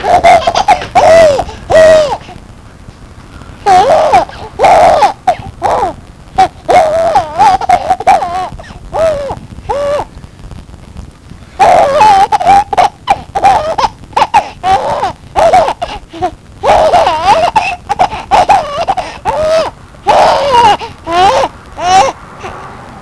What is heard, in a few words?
laugh